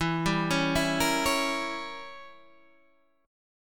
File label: E Diminished 7th Guitar Standard; Edim7 arpeggiated; JGuitar